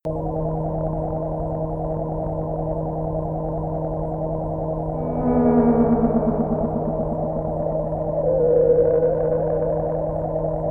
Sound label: crumar DS2